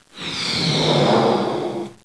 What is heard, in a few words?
BLOW; DIRECTX